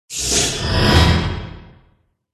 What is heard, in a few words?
dragon blue death